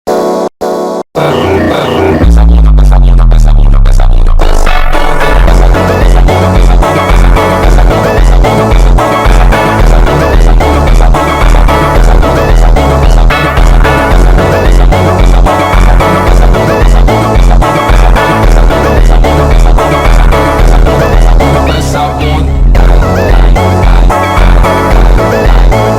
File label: slowed reverb